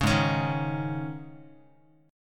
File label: Ab Suspended 4th down Guitar; Absus4 strummed; 4,4,4,1,x,x; JGuitar